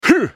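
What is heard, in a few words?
spellcast effort; ZhaoYunXinZhao; Character Voices - English; en US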